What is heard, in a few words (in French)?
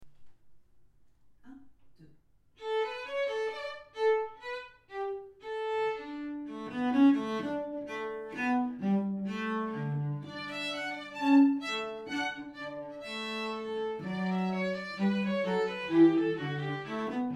violon et violoncelle; Sonate no 2